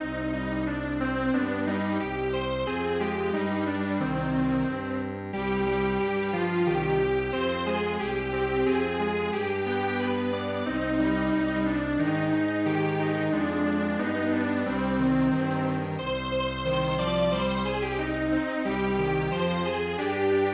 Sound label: Strings Quartet; hinamatsuri